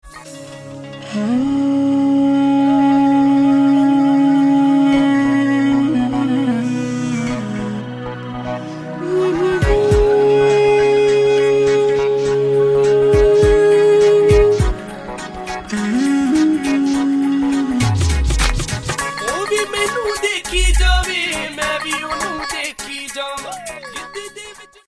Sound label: duduk